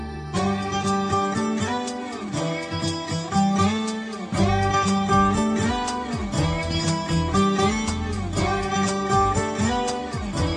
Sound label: Love Tone Guitar